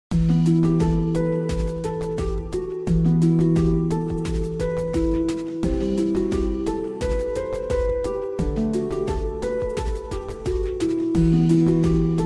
Beautiful Sci Fi Music

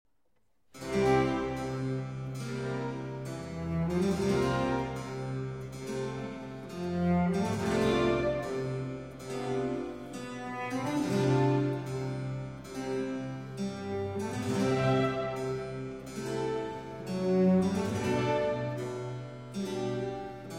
Violin Concerto in A Minor, Andante; II. Andante

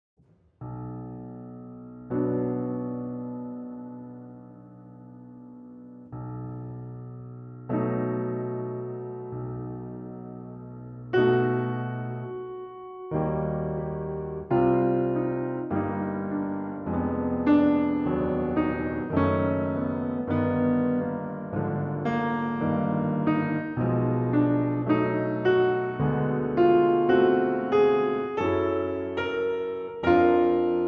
Prélude, Choral Et Fugue, Fwv 21 Chorale

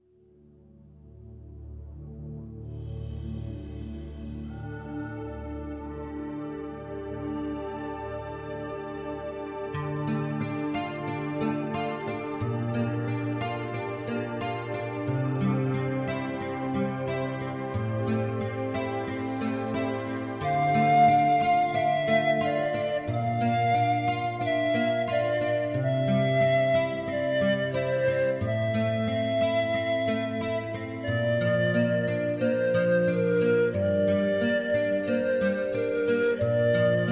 Solo Flute

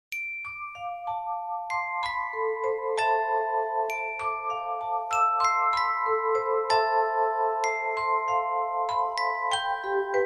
La Valse, Version Orchestre

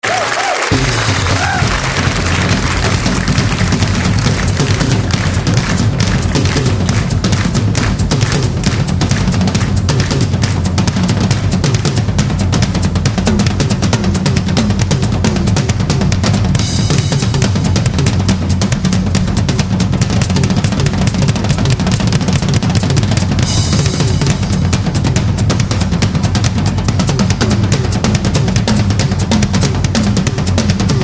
Jazz Hop Rhythm

